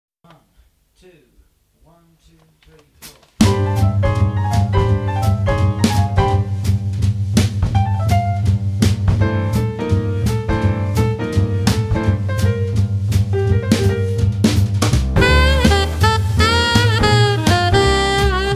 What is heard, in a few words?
medium tempo